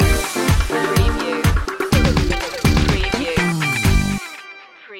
disco balls

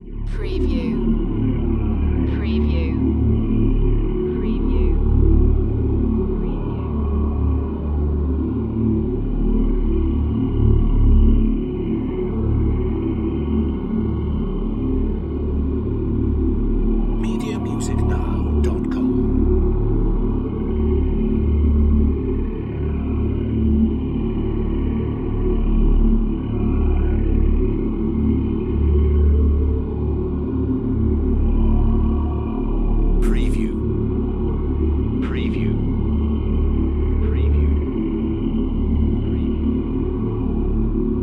Drone Demonic Growl; Sound Effects Bible

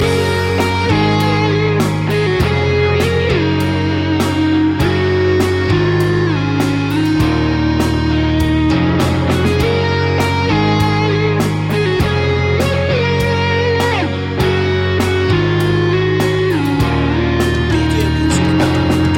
cloudy day loop

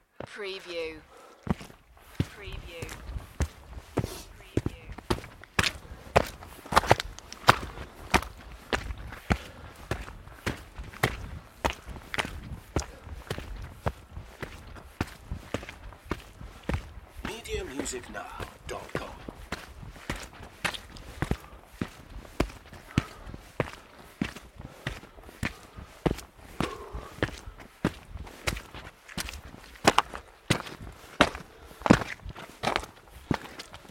Hiking footsteps and breathing; beanstalk audio

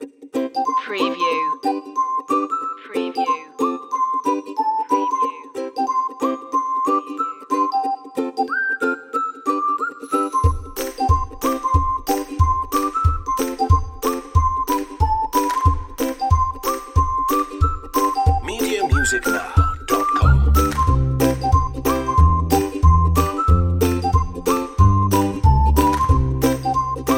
reggae happy loop

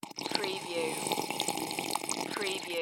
Drink Sip; sound-effects-bible

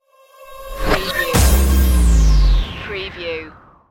destroyed stinger

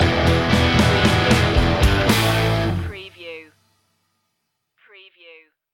cruisin stinger

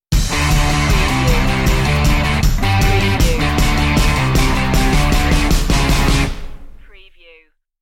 stinger

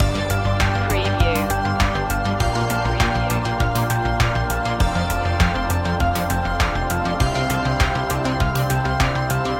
technology loop

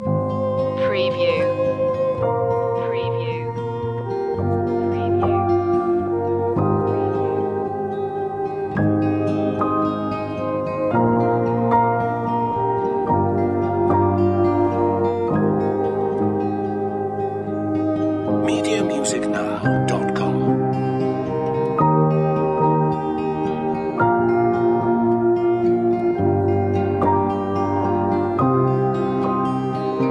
the black forest no vocal

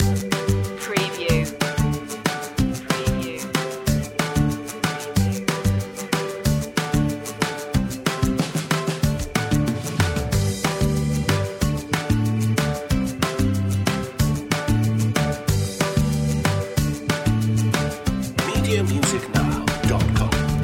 whistle beat loop

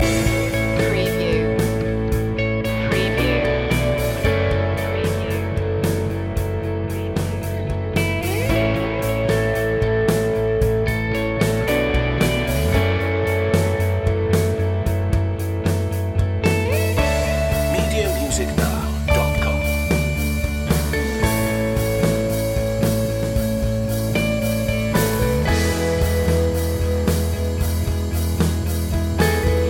break down loop

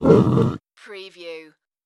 creature vocal

